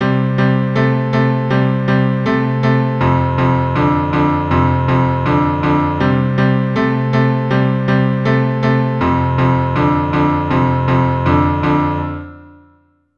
Boogie Woogie bass, keyboard

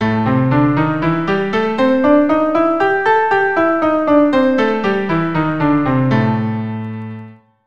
A blues 2 octaves, keyboard

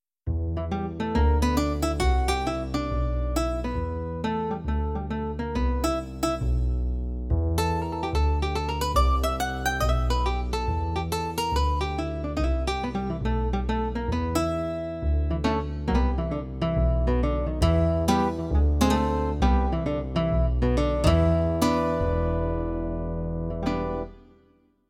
pent

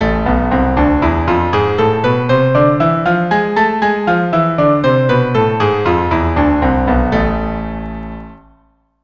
A Harmonic minor 2 Octaves apart, keyboard

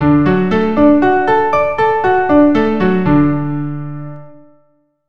D arp 100

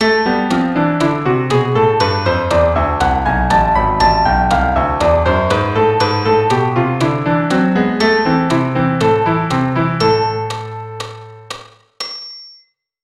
Am melodic

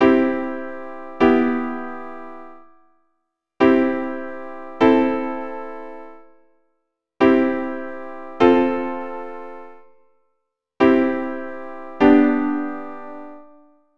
Inversions, keyboard

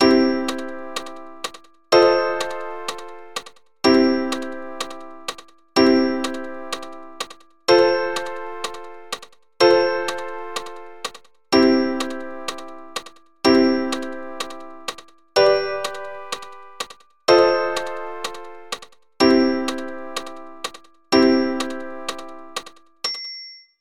12 Bar blues, keyboard

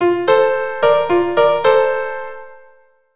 Blues RH F, keyboard

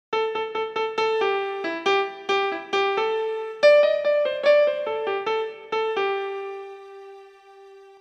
blues

